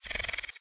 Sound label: say, spider; mob; Minecraft